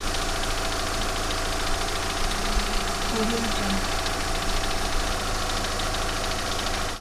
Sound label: car engine idle